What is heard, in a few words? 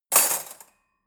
cutlery fork drawer place hard